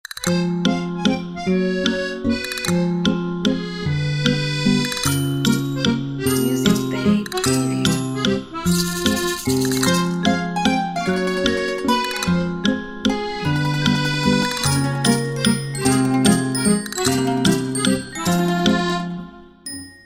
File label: Comedy Intro